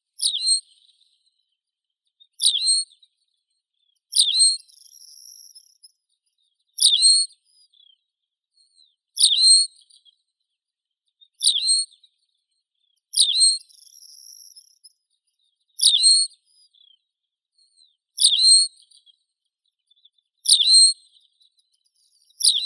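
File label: tawny pipit, Anthus campestris; konek polevoi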